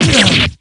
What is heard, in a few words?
damage; kanji